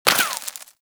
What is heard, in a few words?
Bullet Impact Debris Rock; Warframe; Weapons